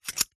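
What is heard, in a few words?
household bolt metal lock or unlock held in hand; zapsplat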